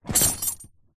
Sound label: fabric bag of metal hand tools set down; industrial; zapsplat